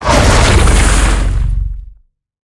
hit ice; smite; heimdallr

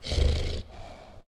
victory snore; Smite; Cerberus

